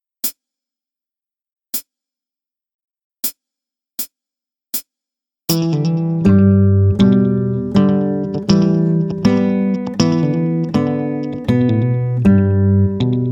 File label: string change